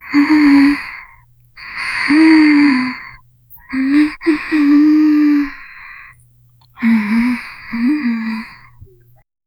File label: a clear and audible girl, sad